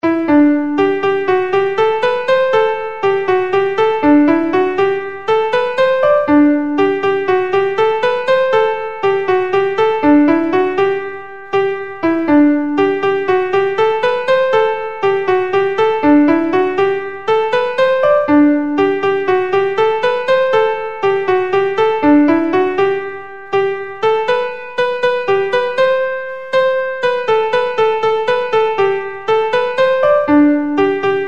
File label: Nicholas McAuliffes Slide